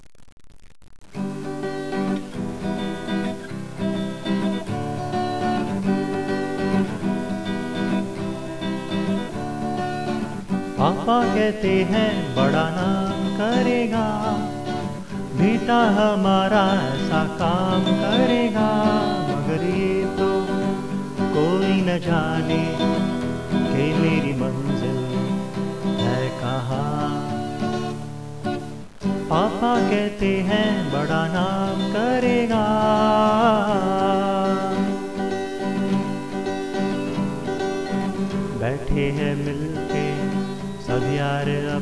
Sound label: song